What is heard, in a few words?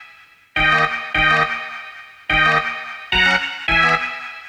String Hits; Nuclear Sub